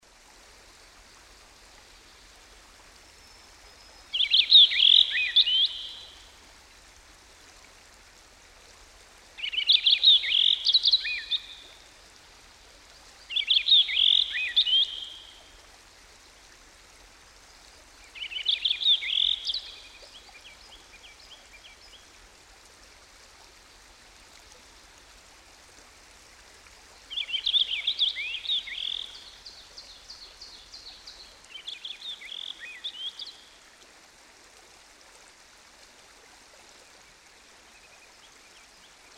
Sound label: Purple Finch (Ovenbird & Ruby-Crowned Kinglet), Songbirds By The Stream; Dan Gibson